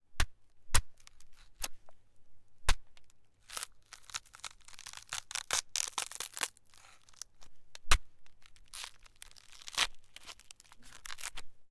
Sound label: body flesh stabbed with a screwdriver; sonniss gdc